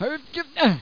Stairs Climb On Taxi

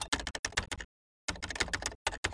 keyboard; The Sum of All Fears

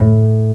bass A1; ClockWerx

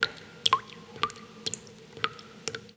rain

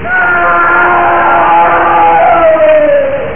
mangle; Theldrow

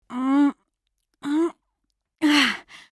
effort; Mmm... Mmm... Ahh!

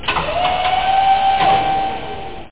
up periscope; alienbreed3d2

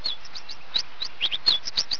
finch; Master Clips Email Animator

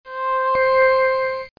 mage spell; Exile II - Crystal Souls

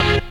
tekk; smash

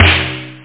snare mid picolo; hr16b

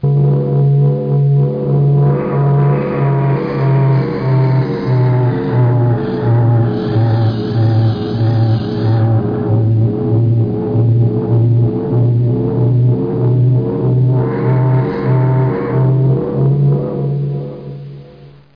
Hum

